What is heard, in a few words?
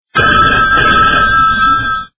ring classic